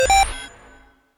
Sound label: Computer; ycrpg